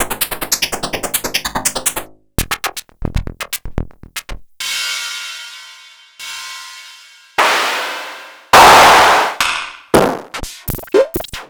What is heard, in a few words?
Hat c